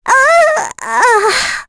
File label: Vox Dead; Reina; kingsraid